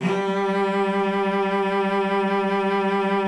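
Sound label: G3, cello vibrato; Xsample VOL-7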